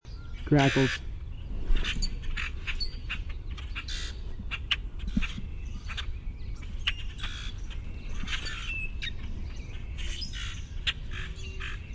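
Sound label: Common Grackle chaa chack squeak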